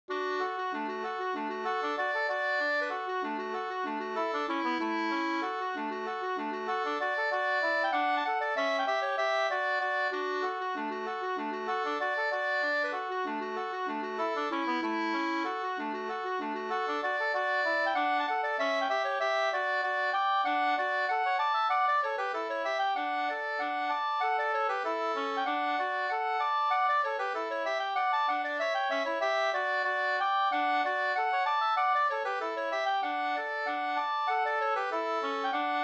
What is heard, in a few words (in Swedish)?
folkdans Rill med polsdans; fr Hogdal-Lommeland Bh 2st